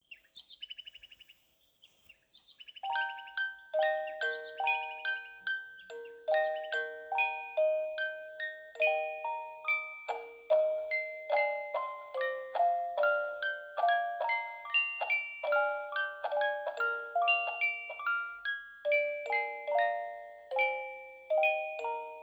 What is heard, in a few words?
lullaby, babymobiel; brams; babymobiel setjes